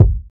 good kickdrum f sharp key, Loudest frequency: 122Hz; kick drum samples